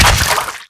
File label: blood, gore; garrysmod; nuke